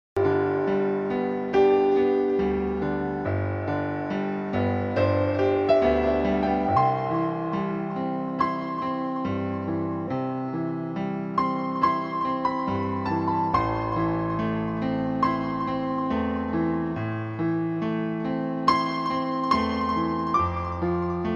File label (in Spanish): PADRE NUESTRO MUSICA INSTRUMENTAL CATOLICA; coros catolicos; canto catolico